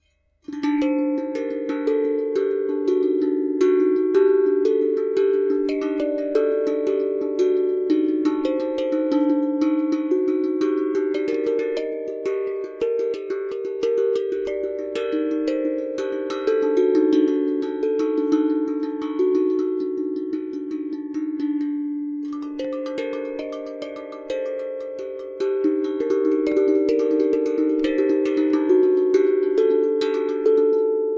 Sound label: Hijaz D4 440